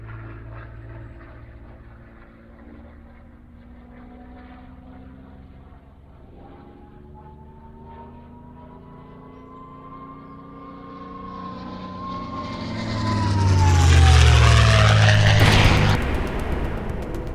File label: Plane Crash